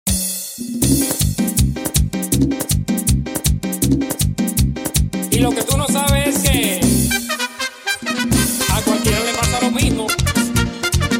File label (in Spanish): Merengue, 160 Bpm